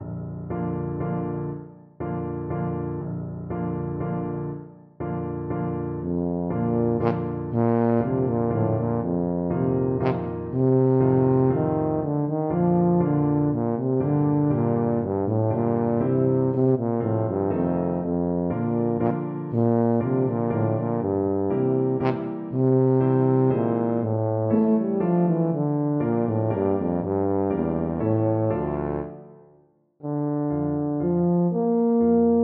saint saens elephant full, tuba

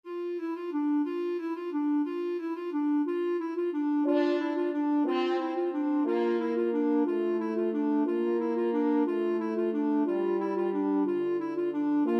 clarinet horn, clarinet-french horn duet

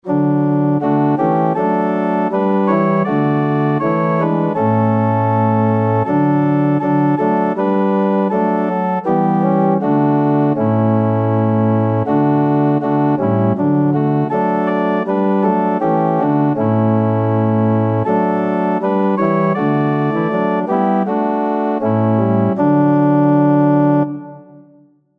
organ

